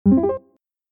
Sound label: arpeggio sfx